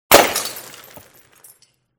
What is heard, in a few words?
Small Glass Break; SOUND EFFECTS1